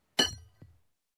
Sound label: Horse hoof sound